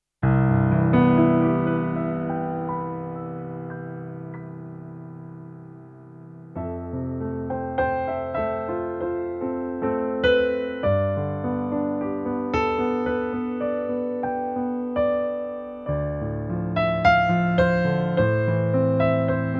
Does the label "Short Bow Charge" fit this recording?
No